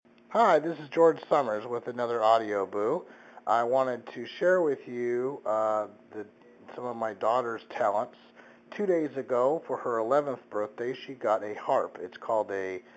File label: daughter playing the harp